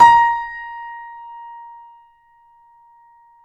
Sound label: KEY, YC7 Piano ff